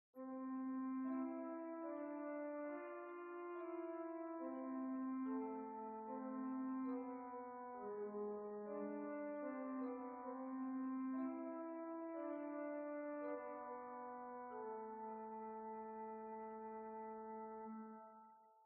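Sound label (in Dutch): gamba 8, orgel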